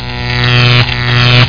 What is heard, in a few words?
Electric; Sirens of the Pleasure Dome